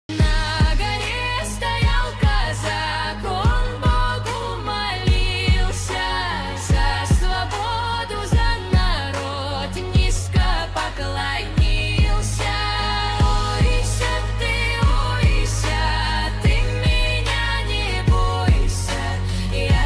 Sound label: rock cover eastern slavic folk